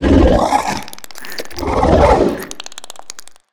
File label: Alien Passying by, Monster Sounds; The Decline Of Warriors; Monster Sounds Volume II